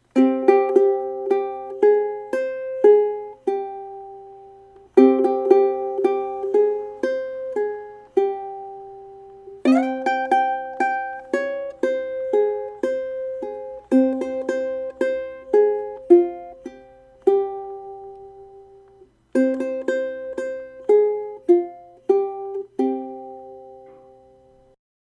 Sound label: In Scale Example, ukulele